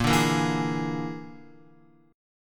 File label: Bb 7th Flat 5th Bb, Guitar